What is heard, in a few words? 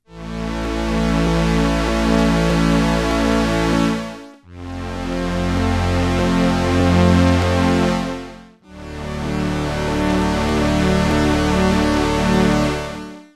Slow Strings